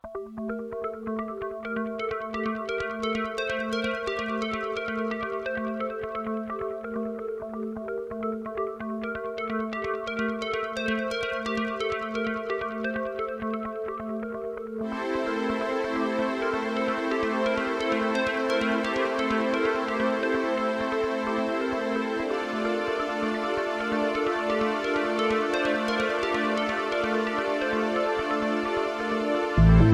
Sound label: Atmosphere Cut